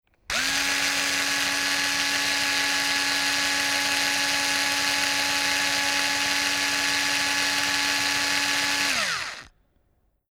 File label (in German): Akkuschrauber Ixo 6 Laufgeraeusch